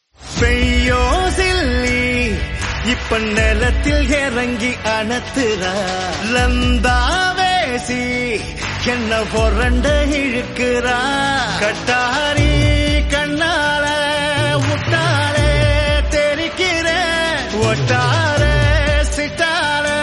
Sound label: Tamil trending Ringtone